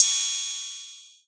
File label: Cym Ride; rainbow laser disco dungeon